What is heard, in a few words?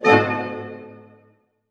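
harp hit D# diminished; Sound On Sound; Peter Siedleczek's Orchestra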